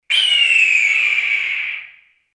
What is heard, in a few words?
Eagle